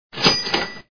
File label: Cash; mega boulder rampage gamerip